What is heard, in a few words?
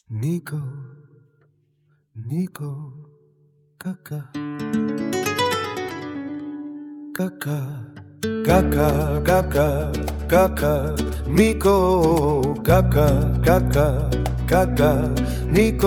flamenco